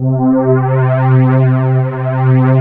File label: JUNO STR